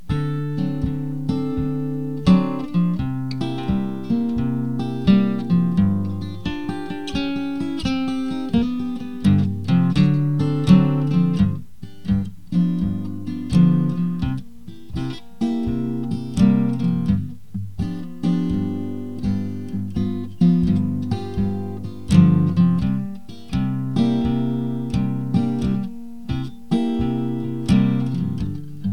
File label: G blues, harmonica